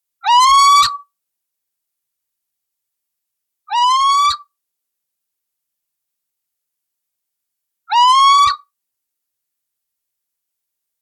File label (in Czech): Sycek obecny teritorialni hlas